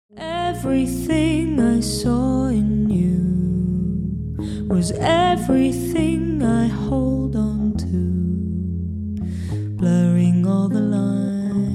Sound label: singing